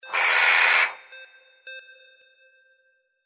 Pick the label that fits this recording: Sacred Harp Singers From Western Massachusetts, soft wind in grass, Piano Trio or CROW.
CROW